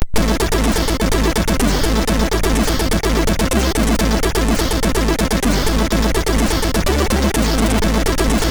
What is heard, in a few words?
Aviator Arcade sid MOS6581R3